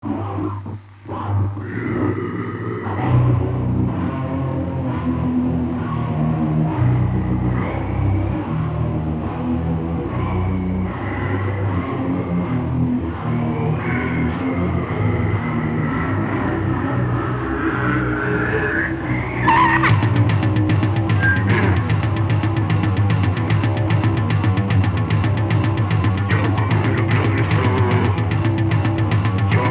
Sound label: garmoshka